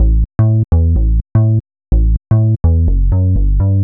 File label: HOUSE BASS 125 A